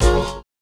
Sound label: Chords Tones; Equipped Music; Loungin House